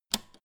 old button press jump out fast

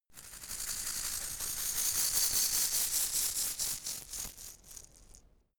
insect shaker rattle dynamic movement

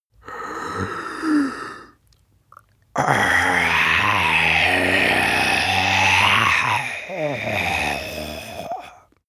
zombie male growl inhale exhale throat

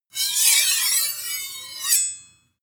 sword blade grind swish long

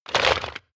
crab movement cracking

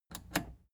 plastic button scratch smooth click disable fast

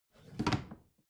bathroom drawer close regular

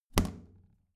plastic trash can close heavy

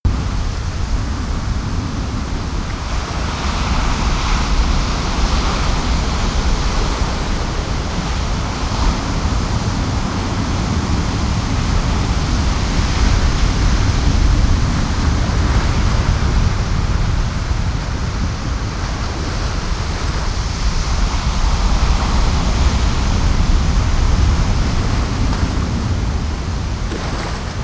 coast loop cliff strong

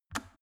plastic water boiler turn switch off

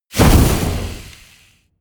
burst

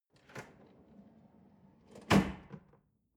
drawer large trash open hard

